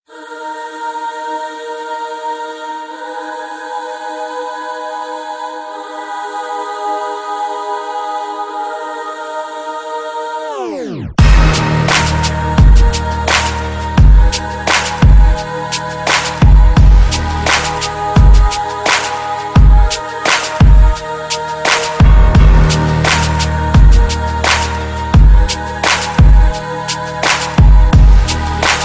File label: Hard Aggressive